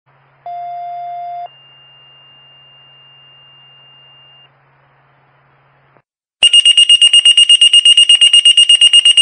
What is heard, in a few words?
Pager tones